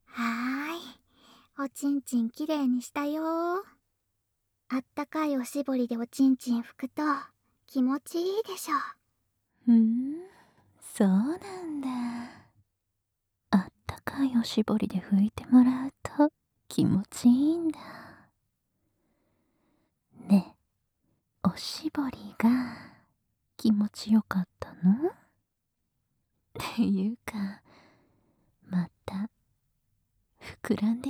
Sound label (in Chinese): 舔耳, ASMR; 摸胸部&撸管舔耳